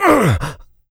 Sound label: pain light, player; small